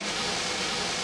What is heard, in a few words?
Brush Swirl Loop; Yamaha DJX-2B